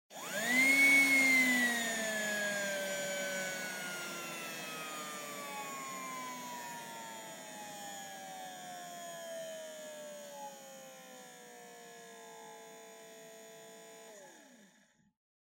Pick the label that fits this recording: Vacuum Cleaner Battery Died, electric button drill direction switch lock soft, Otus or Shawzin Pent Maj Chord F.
Vacuum Cleaner Battery Died